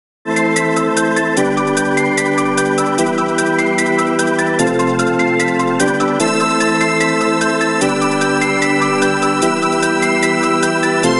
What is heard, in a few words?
beat; Game Soundtracks